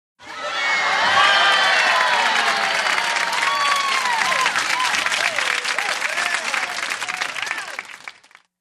Crowd Applause Cheer; medium

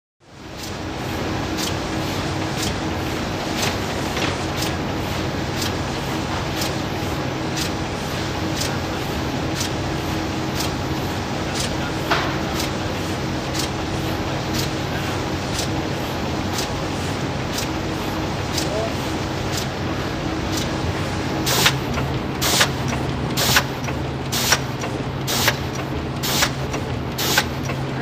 Int Soap Factory Atmo, factory

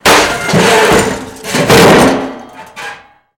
Body Fall On Metals

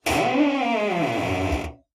screech, squeaks

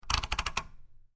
Rotary Dial Turns, switch clicks

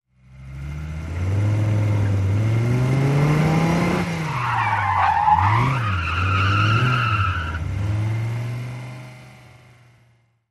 Idling Accelerate, sports car

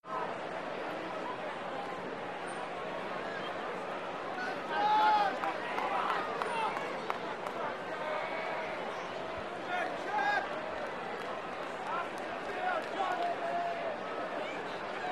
Arena Crowd Idle; sports arena

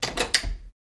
Dead Bolt Unlock; lock & keys

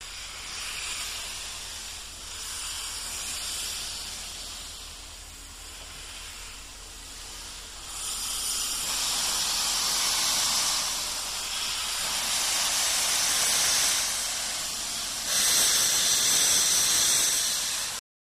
Steam Release Slow, steam train

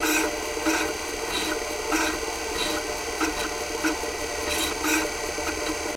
Apple Ii Disk Wipe

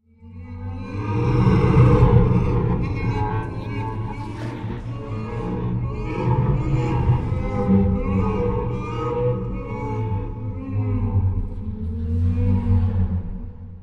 Monster Laugh Eerie

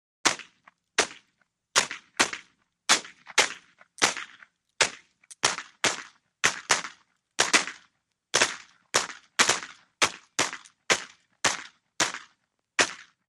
44 Cal Pistol Several, 44-caliber exterior

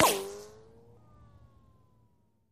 Bullet Ricochet Sin

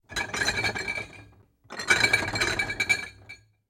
Glass Clinks Glass Bottles

